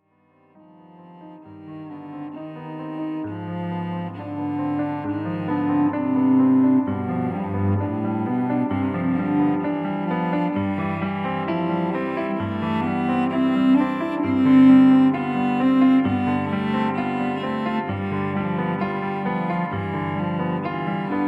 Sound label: Improvisation over Fauge